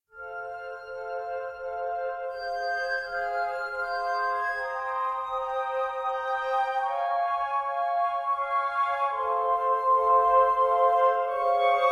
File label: Dawn Music; creativerse; Foggy Molten Cathedral